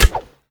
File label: crossbow firing bolt; gamemaster audio